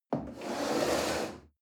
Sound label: chair wood slide on floor medium